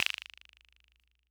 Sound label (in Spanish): Quijada, classic machines